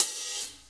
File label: Open Hhat, Hihat; Equipped Music; Loungin House